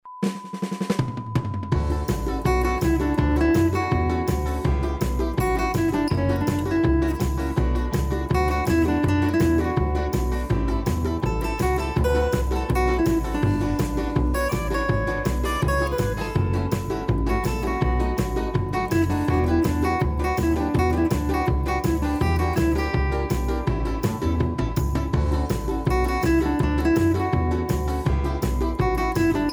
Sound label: music on guitar